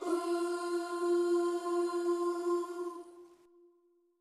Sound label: Vocals